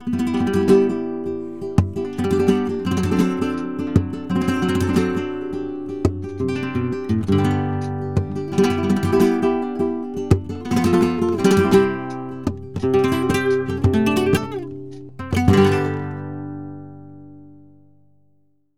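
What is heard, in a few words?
guitar, 160, ALEGRIAS, Flamenco; Best Service ProSamples vol.46; AKAI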